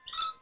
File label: Bird chirp (Red Lories)